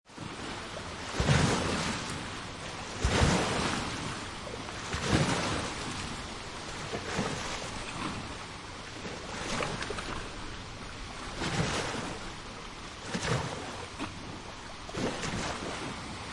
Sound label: waves crashing lake close nice stereo some individual